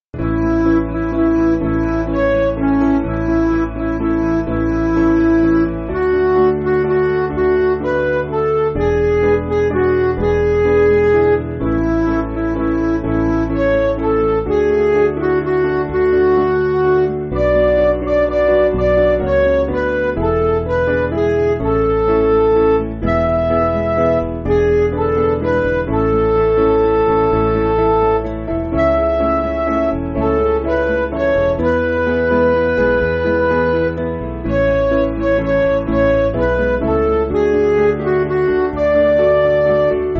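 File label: Midi, Music Backing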